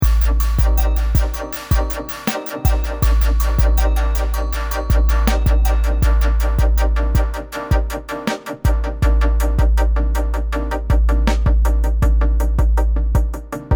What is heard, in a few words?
beat; moh samples